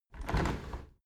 window handle squeak turn open halfway regular; objekte; fenster

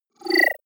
disappear flicker; soundpool

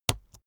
keyboard laptop enter press slow hard; kommunikation; computer

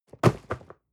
cardboard box medium fall high; objekte

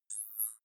zoom in

